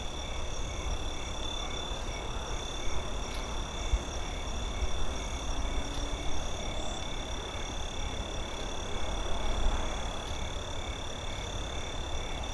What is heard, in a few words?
ambi; Mafie 1